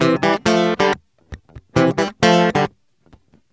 MERENGUE, World Grooves

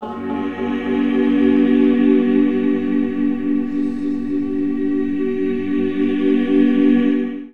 VOX, Underground Hip Hop; USB Soundscan vol.02; AKAI

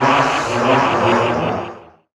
weird; Best Service - XXL Production Effects

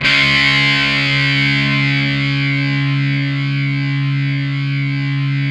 METAL C#3; InVision Interactive; Lightware VOL-1; Instruments & Percussions; METAL 5THS+

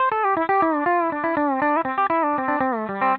PD LOOPS 120, Whole Lotta Country

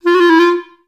F3, orchestra; Best Service; Peter Siedlaczek's Advanced Orchestra

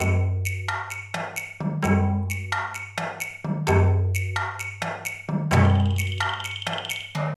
World, Percussion Loops

